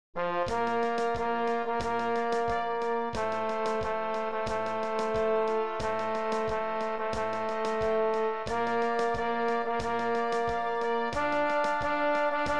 bariton

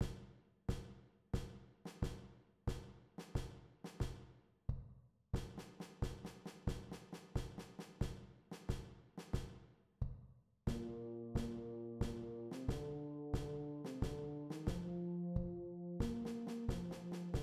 perc

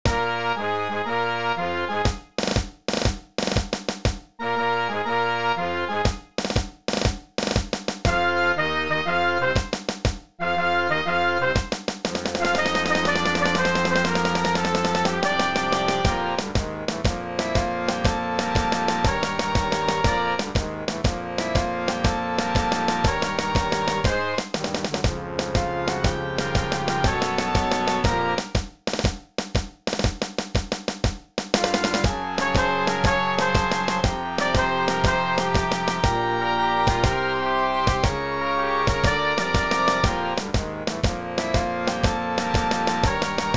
drums

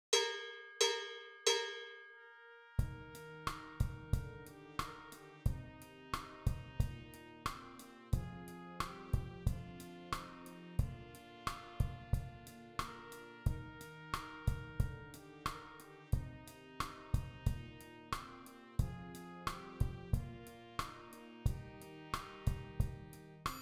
perc

